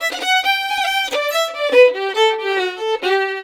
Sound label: violin, Celtic Flavours; USB Soundscan vol.42; AKAI